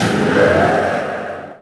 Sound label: inv switch; Thief II; invman